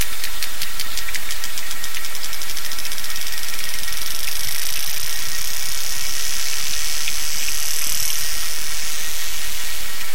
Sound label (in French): Cachalot